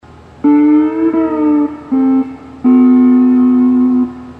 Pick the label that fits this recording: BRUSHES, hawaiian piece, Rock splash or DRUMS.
hawaiian piece